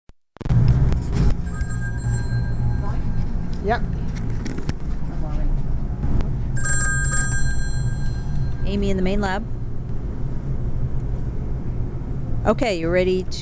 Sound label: XBT launch; irminger rings in the labrador sea; audio postcards